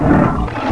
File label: HURT